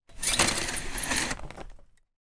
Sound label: household blind vertical sliding open or close